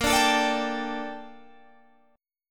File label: A sharp Major 13th, Guitar Standard; A#M13; strummed; JGuitar